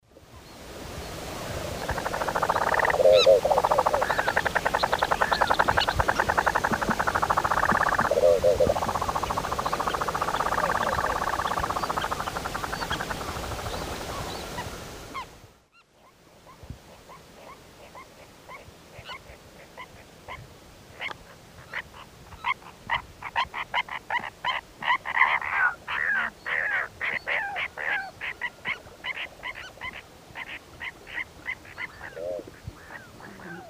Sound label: Chordeiles rupestris, flight and chase calls; S. America; Eastern Lowlands